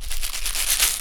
Shaking Box of Screws; BB Retail Therapy Sample Pack

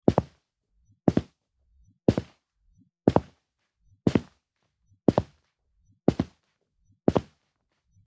Concrete Footsteps; horror; horror sfx vol 1

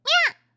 swing, fighting

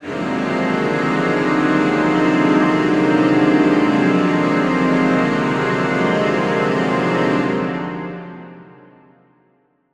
Suspense Strings Mid, horror strings

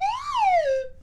Fast Rise Fall, Slide Whistle

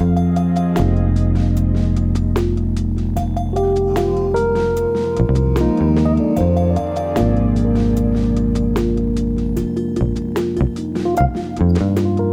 Chillout Loop; BB 2HTC Samples Vol 4

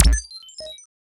Computer Beep; FREE SFX PACK VOL 3

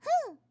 jump, Catgirl Fighting SFX

